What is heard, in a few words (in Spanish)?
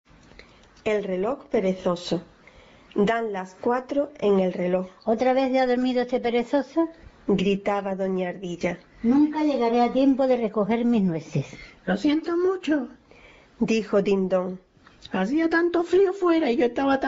reloj perezoso